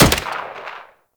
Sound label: Rolling Drone Turret Fire; Warframe; Grineer